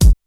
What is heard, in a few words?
KOMMERZDRUMS